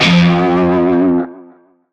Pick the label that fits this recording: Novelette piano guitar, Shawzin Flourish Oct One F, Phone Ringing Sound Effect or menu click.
Shawzin Flourish Oct One F